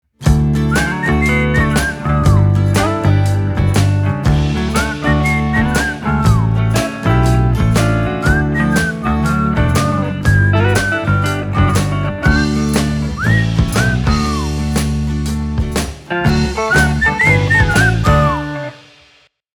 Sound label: BRANDSONG